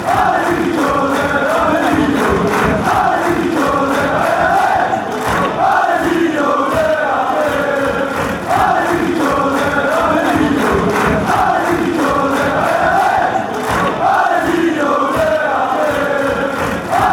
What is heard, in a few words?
allez, fan chants; lyonnais